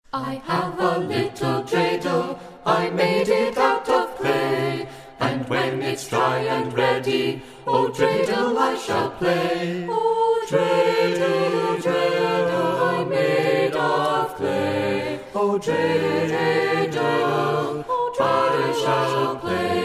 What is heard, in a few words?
dradel song